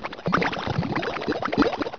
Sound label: bubble